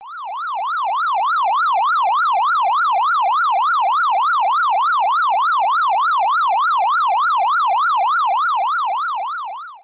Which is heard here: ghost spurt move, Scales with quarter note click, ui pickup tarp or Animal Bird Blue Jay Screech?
ghost spurt move